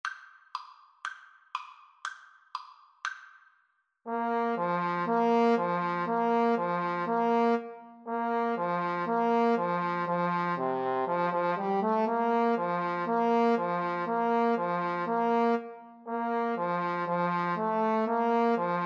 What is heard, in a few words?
baltimore belle trumpet trombone